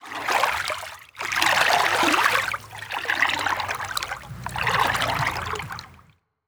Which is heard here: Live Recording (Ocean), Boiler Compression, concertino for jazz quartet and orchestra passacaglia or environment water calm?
environment water calm